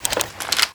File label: shot attach reload end, foley